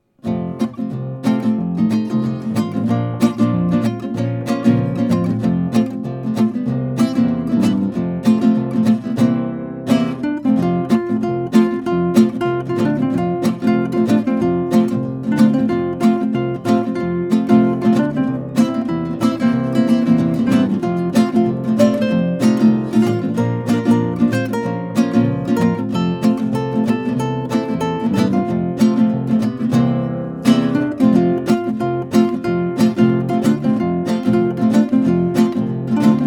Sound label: karaoke